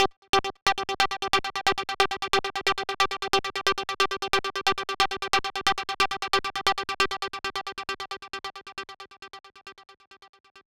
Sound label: 135 Synth Fx